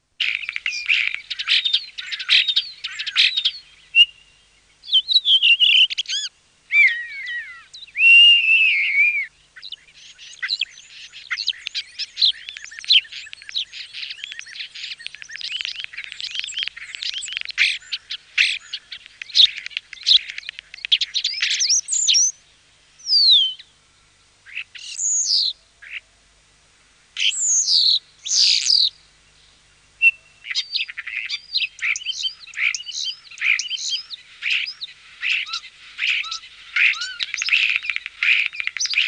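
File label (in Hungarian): Seregély; Madarak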